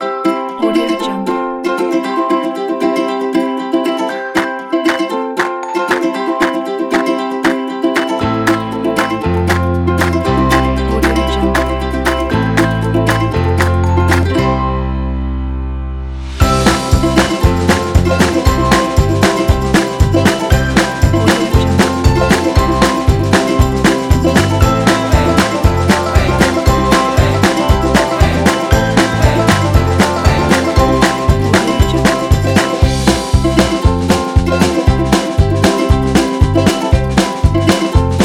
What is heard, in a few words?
uplifting optimistic folk